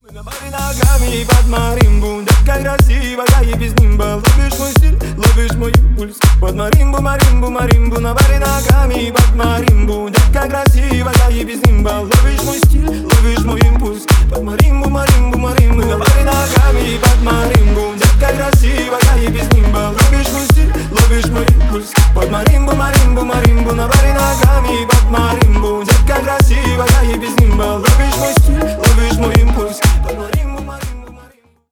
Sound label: marimba remix